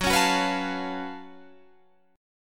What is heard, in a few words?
Gb Minor Major 11th Gb down Guitar Open G